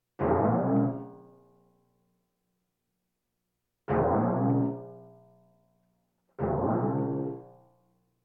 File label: Percussion Bongos Tympani rolls Gongs Drums